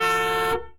horn car; Blind Drive

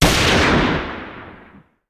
Hand Gun; Sound Ideas; Guns

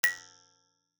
Button Spring Small Springy Button Beep; Hollywood Edge; sound designer's toolkit v2

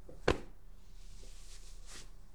give hand; Apotheorasis

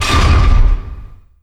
Door Large Metal Stop; SFX Kit; Doors

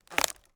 CREAK Wood Tree Creak Quick; mono; Universal Sound FX

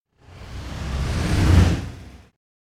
Whoosh By Air Classic Passing; Hollywood Edge; sound designer's toolkit v2

